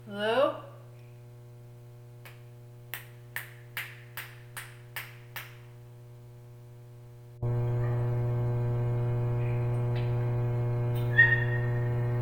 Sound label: knocks faucet